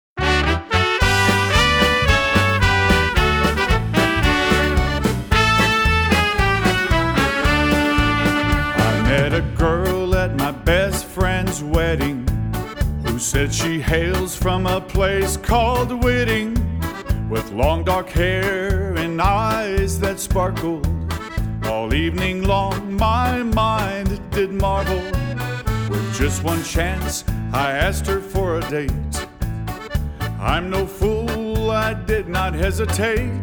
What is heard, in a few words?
Polka